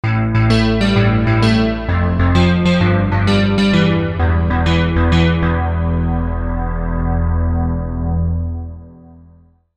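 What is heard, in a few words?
AMBIKA 48kHz Single Sample velocity to pan; EIII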